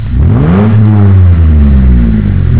rev, carmod

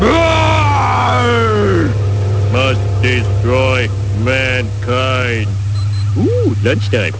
destroy

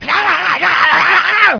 zombie scream; zombierpg

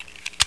clip in, beretta; weapons

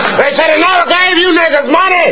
money

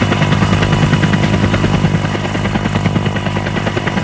helicopter loop

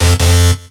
error beep

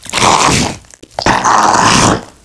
police hold loop; infection

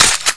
bolt slap, aug; weapons

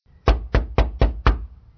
door knock

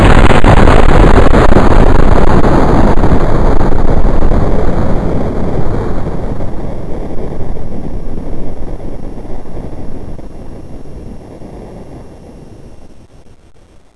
explode

